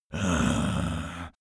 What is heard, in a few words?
zombie moan idle throat